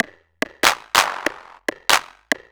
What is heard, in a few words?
808 Loop SD 95, Roland 808 Drum Loops, 95bpm